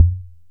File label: impact Soft heavy